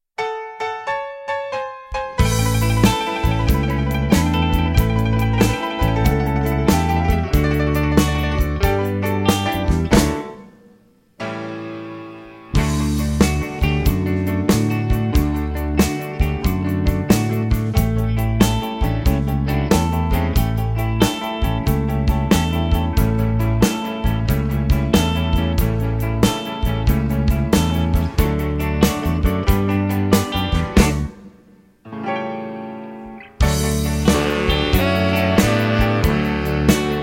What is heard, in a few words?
Everybody Wants To Know horns up TV